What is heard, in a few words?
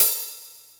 Electronic Pedal Hat; Yamaha PSR E305